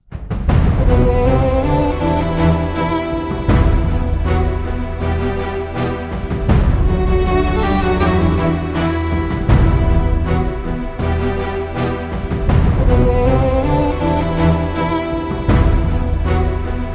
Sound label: Love Story Trailer BGM Mix; Chennai Love Story